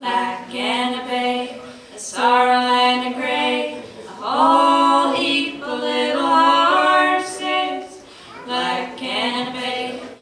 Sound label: musicbox